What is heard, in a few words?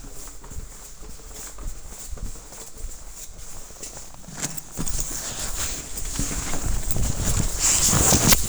foley